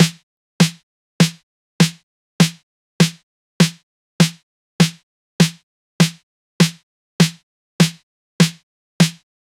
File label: 80s snare drum